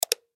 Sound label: Button Switch; Multimedia Web Interactive